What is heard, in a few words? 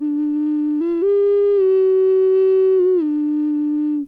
Ocarina Loop